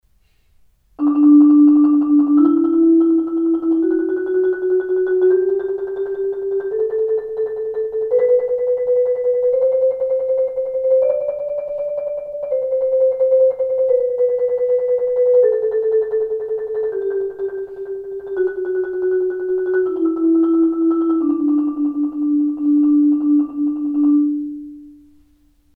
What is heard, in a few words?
mallets